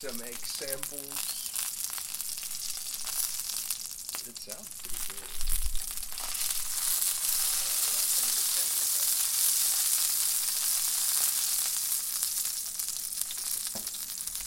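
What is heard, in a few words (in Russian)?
жарка яиц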